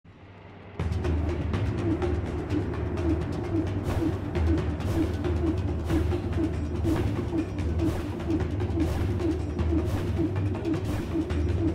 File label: drum drop treasure good; dota